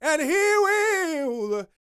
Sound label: Vocal Gospel; Vocal Planet Vol1 Gospel